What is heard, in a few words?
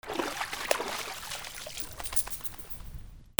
DOGS